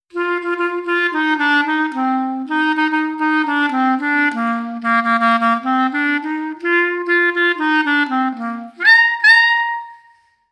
clarinete

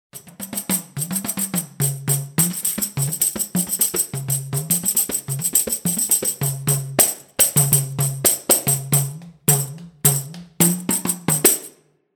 pandereta

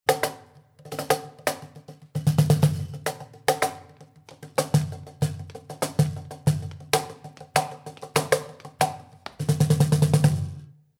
cajon; Podemos acompañar al cocinero haciendo diferentes ritmos con cucharas, ralladores, cacerol

